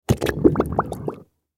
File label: Mud Suction, Mud Suction Liquid Gurgle Thick Submerge; Foley; Blastwave